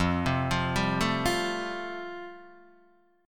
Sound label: F Major Add 9th, Guitar DADGAD